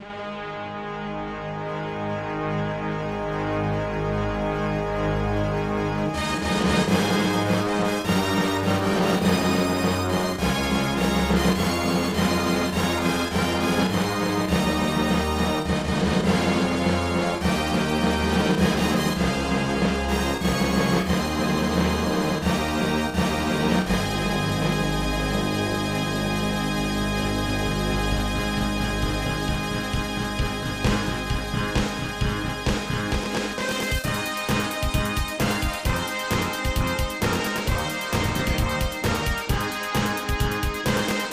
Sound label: Victory; J.League Soccer Prime Goal; SNES